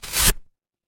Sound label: paper fabric tear